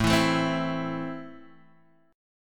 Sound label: A Minor, Guitar, DADGAD